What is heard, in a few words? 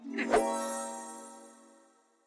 jackpot Throw; irish pot luck